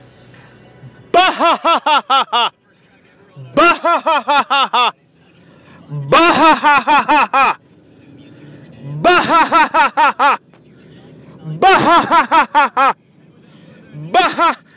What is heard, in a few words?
bahahahahahaha